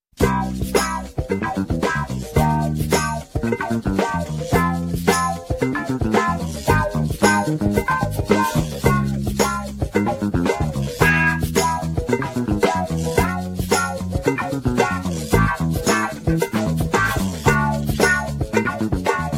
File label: Cowbells; Match Game; Think Cue 4; The "Cowbells" Think Music.